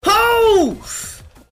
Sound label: oahhhhfww